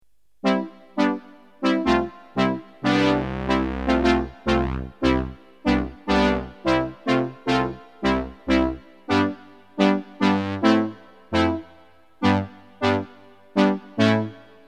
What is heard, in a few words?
brass; jurgen Haible; synth